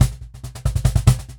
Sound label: Cajon Fill; musicradar